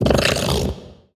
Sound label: bighorn hurt react